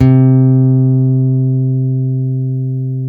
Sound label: STEEL, Guitars; PARKER STEEL; Spectrasonics; Hans Zimmer Guitars VOL-1